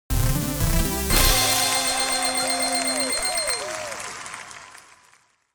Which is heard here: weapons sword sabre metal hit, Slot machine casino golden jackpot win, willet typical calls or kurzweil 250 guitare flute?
Slot machine casino golden jackpot win